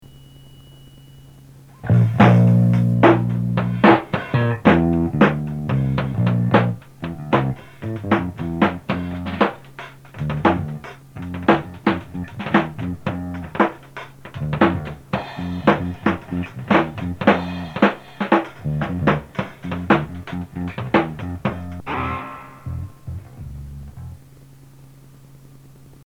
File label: Sub Jazz; Sub Society